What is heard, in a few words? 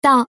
Alarm Sunny,天气动态闹钟 dynamic alarm 晴天 Sunny; Xiaomi MIUI 12.5 China; MIUI12.5系统音效全收录; Reach